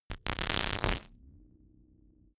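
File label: simple crackle short, science fiction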